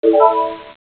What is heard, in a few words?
Third combo; quadrapop mobile gamerip 2005